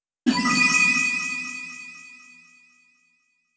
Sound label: Common Sparkle; animal crossing happy home designer